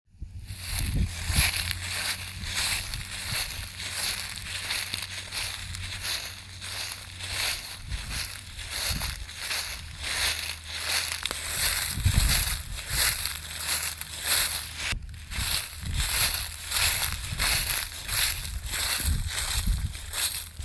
Crunching leaves

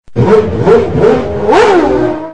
Ferrari

